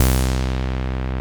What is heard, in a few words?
TEISCO, SEQ-FX; Masterbits; Vintage Voltage